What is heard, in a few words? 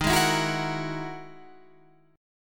E Major Sharp 11th D sharp